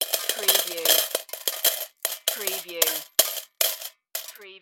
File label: Coin Drop In Tin Can